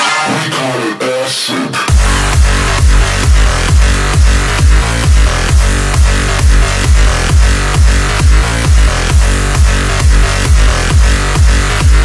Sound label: electro; roundsound